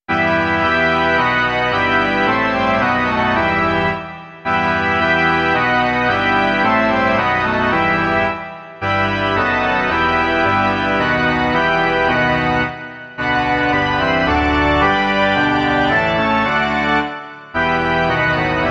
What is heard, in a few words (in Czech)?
varhany; Beránku Boží, Kriste